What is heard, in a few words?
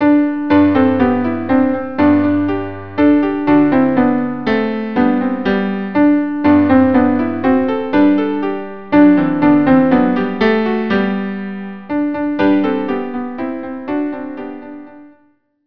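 cello piano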